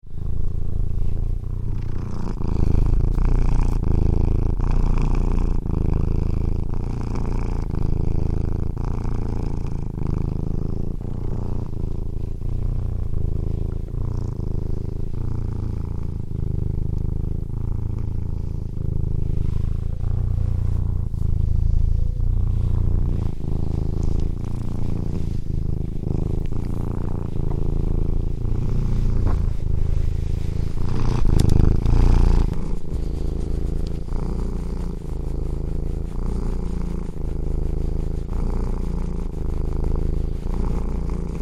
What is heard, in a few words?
Cat Purring - close recording; Cats; Animals